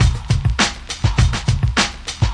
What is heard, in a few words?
lofi busy loop, drum loops and breaks, 102 bpm; 101 to 110 bpm